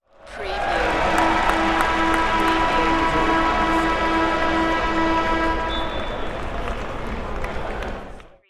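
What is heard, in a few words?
Crowd Cheer Air Horn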